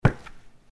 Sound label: Footsteps Concrete Asphalt Street